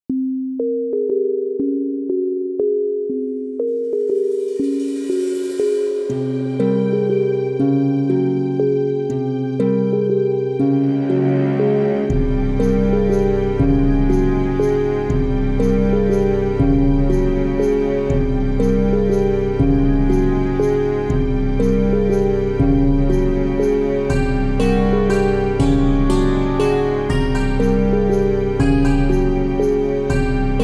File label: Spooky Hopper; Frogger Helmet Chaos; gamecube